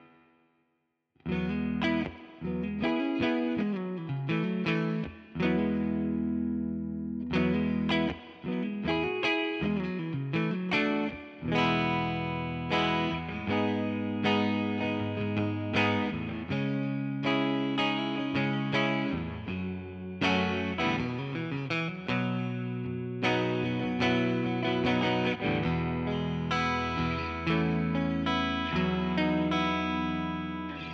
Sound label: Mid Clean, electric guitars; Gusta GST Standard; gst models